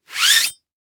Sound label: Weapon Sword Remove From Sheath Cloth; AdobeCC